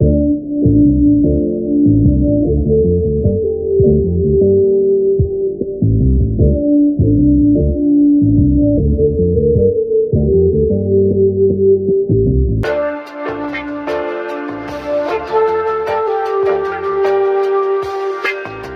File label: flute, Jazzmatazz loops